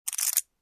Poker Chips

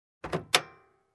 Unlocking engine hood

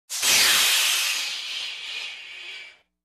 Extinguisher Hiss

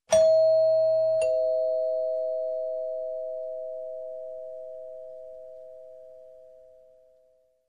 Doorbell single slow

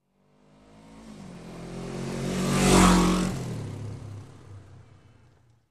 Motorbike passing by

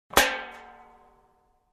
Cookware top banging

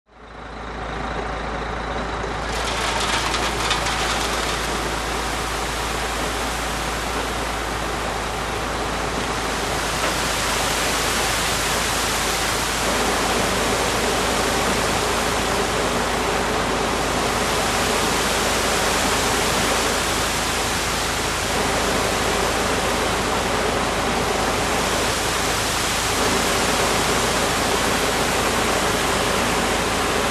Construction

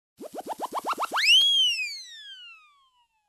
Cartoon Spin

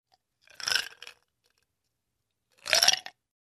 Putting ice cubes in drinking glass

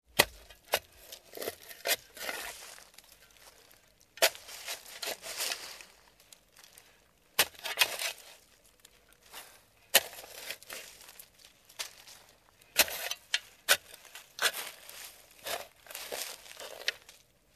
Digging whole in dirt

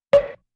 Hollow knock sound

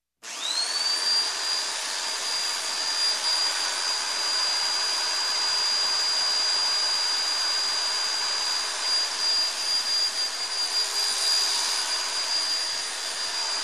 Electric Hand Drill Turned On and Off

